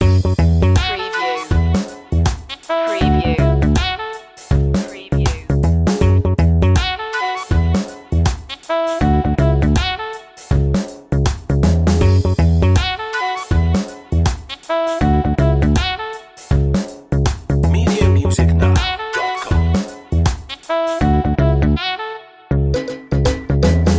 spanish mood loop

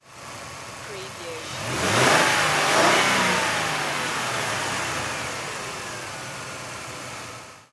Car Mercury Sable High Double Rev

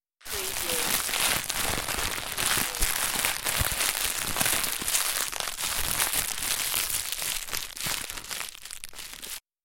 Plastic Crinkle; beanstalk audio

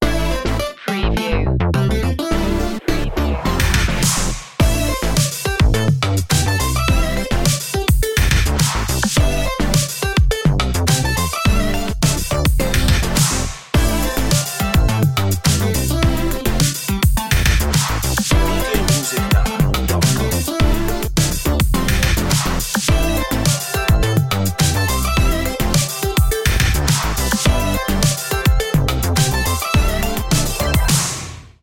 beat machine

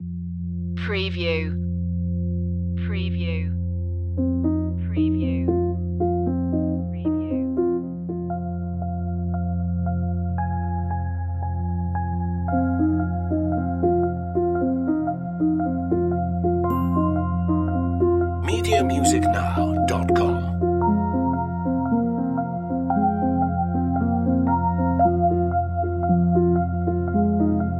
no perc

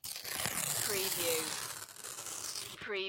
paper rip

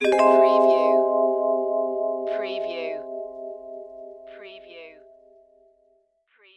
Music Vibraphone Chord

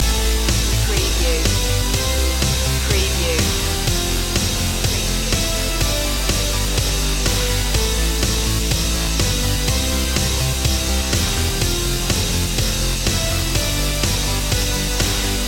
upbeat in the 80s loop

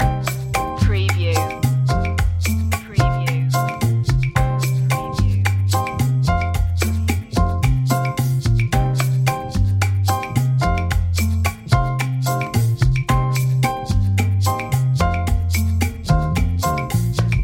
havana lounge loop

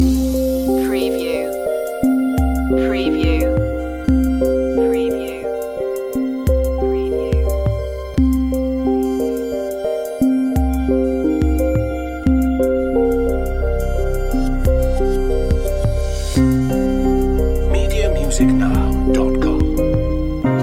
crystal night

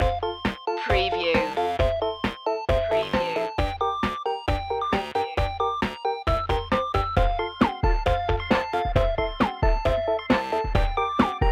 gamer girl loop

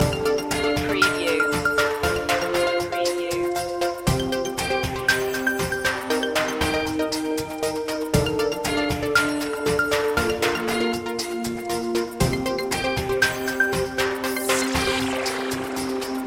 informative board loop

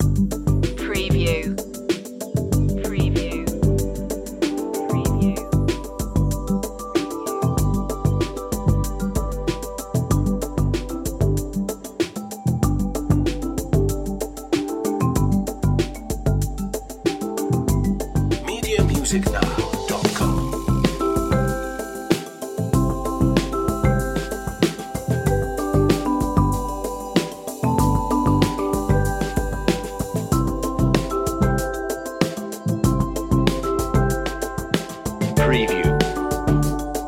feel good island loop

